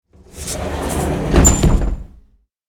Train door closing; vehicle

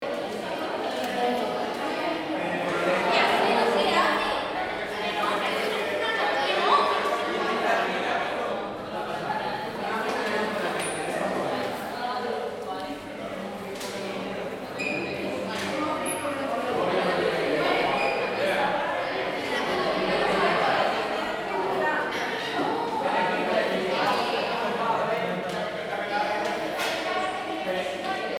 High school cafeteria students talking

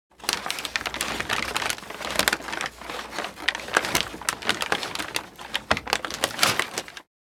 Loop paper handling

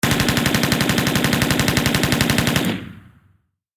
Machine gun burst tank turret

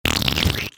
Cartoon fart splat wet airy bubble

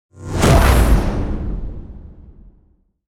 Shockwave explosion big hard impact glitchy

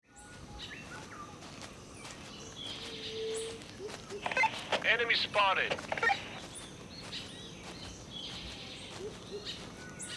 Jungle patrol ambush ambience

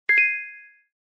Classic game level up

